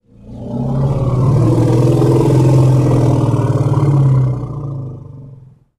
Elephant Growl Singl

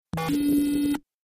Alarm Synthetic; hi-tech-various

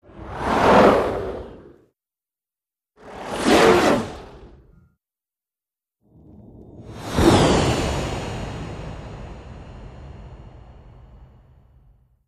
Huge Whooshbys X3, whooshes & swishes

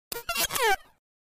Button Sci Fi Beep T, buttons & beeps

